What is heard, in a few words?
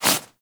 Generic Quick Foley; Warframe; Characters